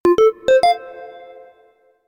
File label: alert